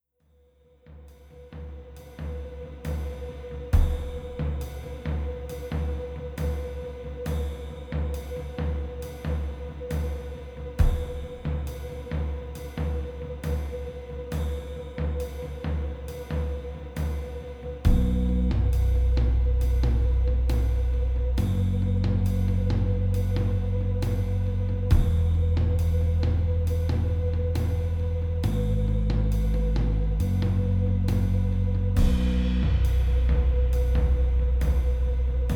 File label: rhythm